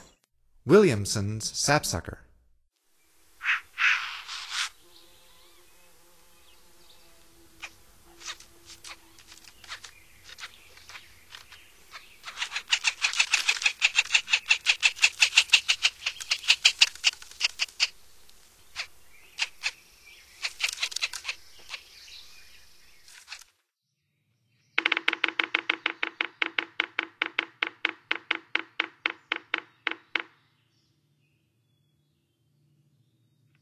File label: Williamsons Sapsucker, Songs of Birds of North America; Stokes